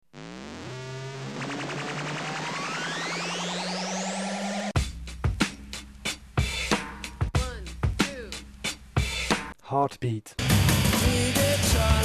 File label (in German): heartbeat lang